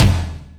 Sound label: tom; drums; virtual drum sounds